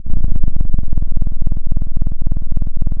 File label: pop, Sound of a cat purring